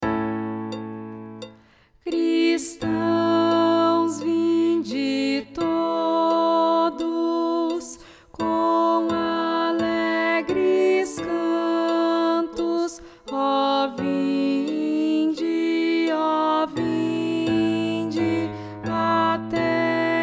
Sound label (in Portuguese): contralto